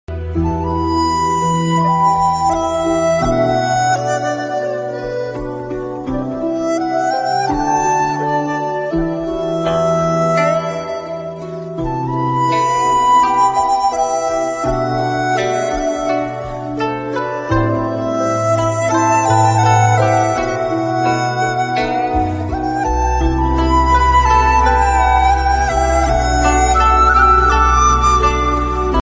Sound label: very soft refreshing flute instrumental tone ringtone